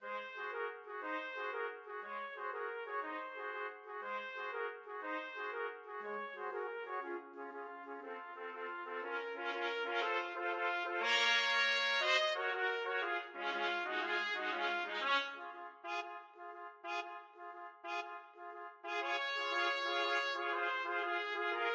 funiculi trumpet trio

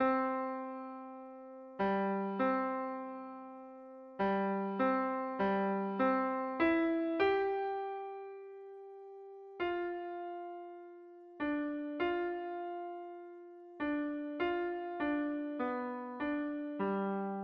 mozart eine kleine easy, piano

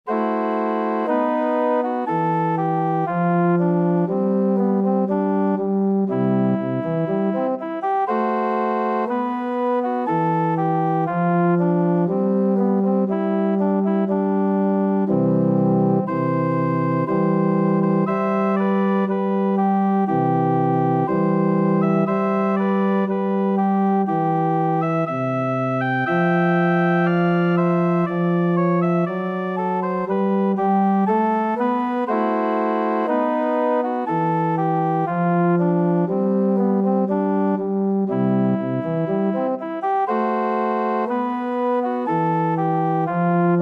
mendelssohn wedding march ORG; organ

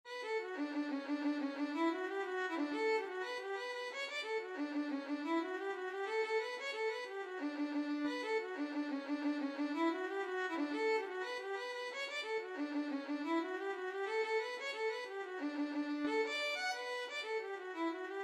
violin

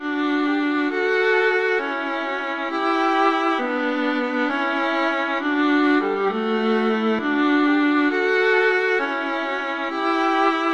sonata, violin viola duet

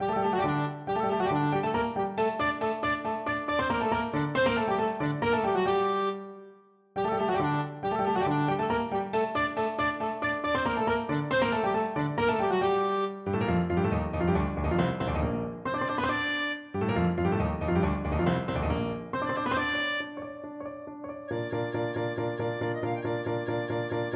anvil chorus, clarinet

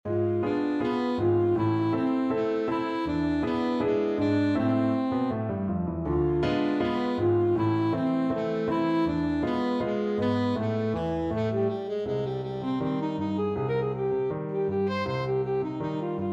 el choclo

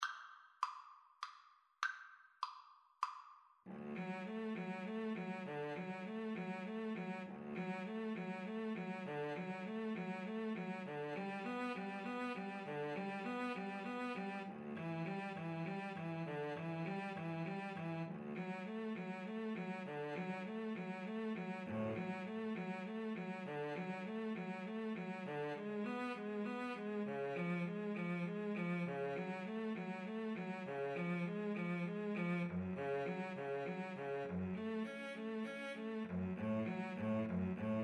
swan, Violin Cello Duet; saint saens

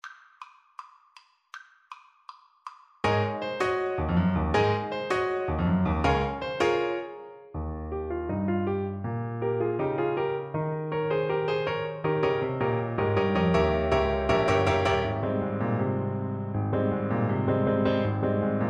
Para Julen ASAX

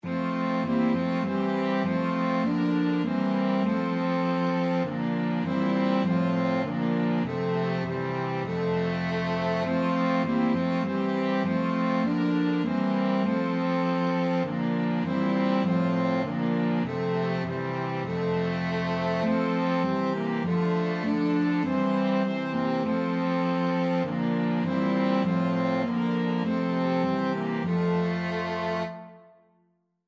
flexible mixed ensemble 4 players

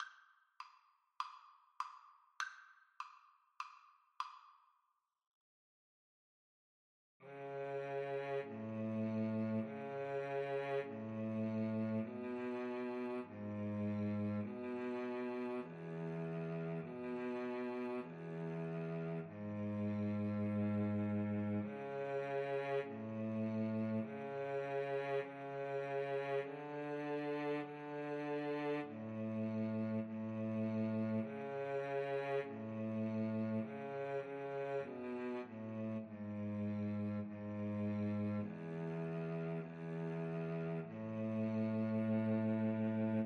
Cello Duet

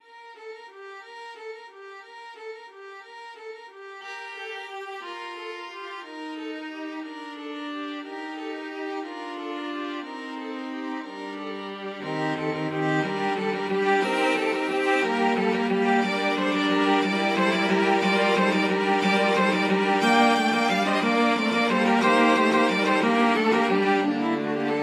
string quartet; carol of the bells

